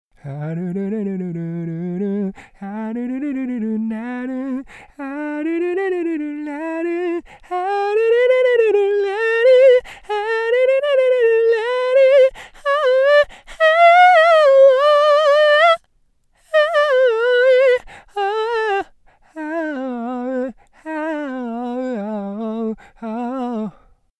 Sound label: vocal